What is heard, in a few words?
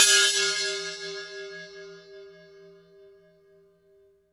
SPIN GONG, Drums; Distored Reality 1&2